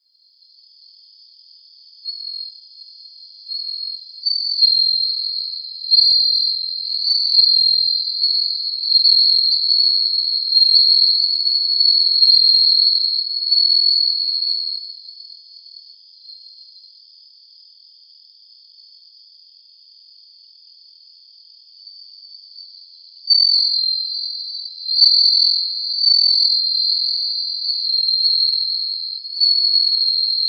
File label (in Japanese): suzumushi